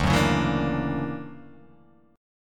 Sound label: D Augmented Major 9th down, guitar; D+M9 strummed; JGuitar